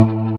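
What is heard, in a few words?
chord organ, Stabs, House; E Lab; XTc Files of House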